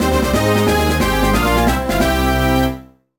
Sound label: Jingle; Dig Dug: Digging Strike